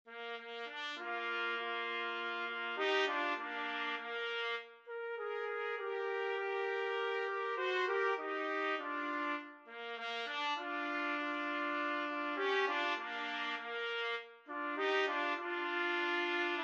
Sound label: 2 trumpet, trumpet duet